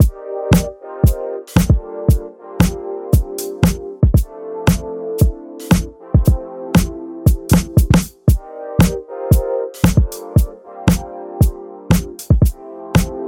future city funk ringtone

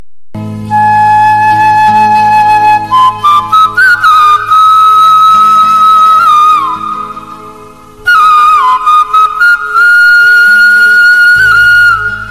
famous sad flute tone ringtone

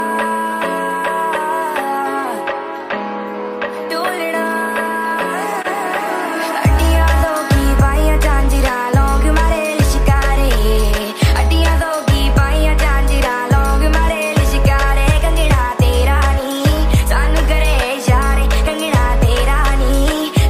bollywood mix song ringtone

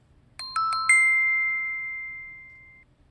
cute magical lovely bell sound tone

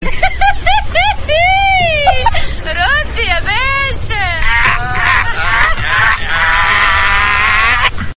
funny woman laugh mobile tone; funny ringtones

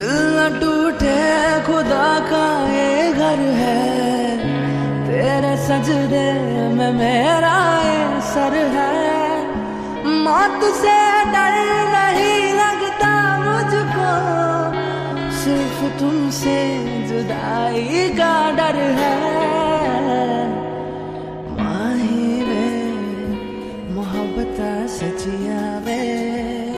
sad song ringtone

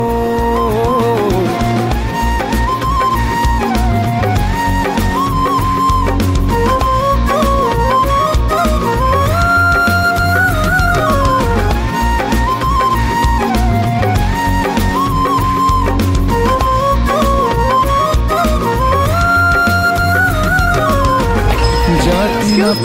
best music ringtone

